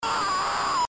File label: axe